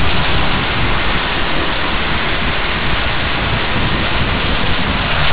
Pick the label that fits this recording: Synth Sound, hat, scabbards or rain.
rain